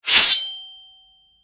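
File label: a sword slashing realistic, blade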